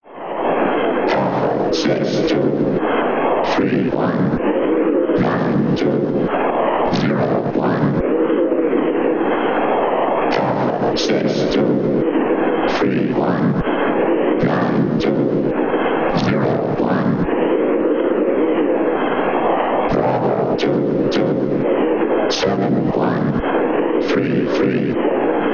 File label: weirdo radio